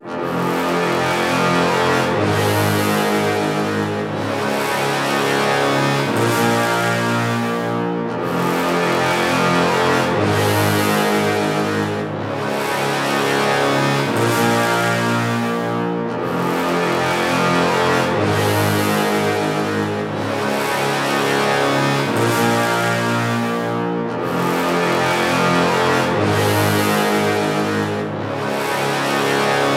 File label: Low Brass - Fm 120Bpm; Construction Kits; STEMS; Ghosthack; SkyRealm; Kit 25 - Vvardenfell